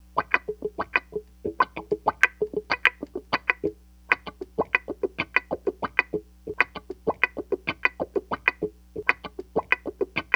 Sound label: WAH GTR; PlatinumPhattCD2; E-MU Producer Series Vol. 8 – Platinum Phatt